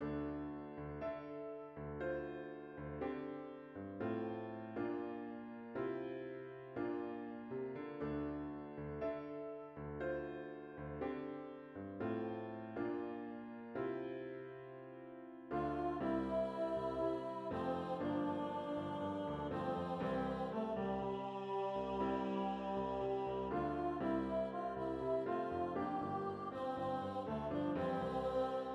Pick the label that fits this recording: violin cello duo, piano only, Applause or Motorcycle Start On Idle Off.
piano only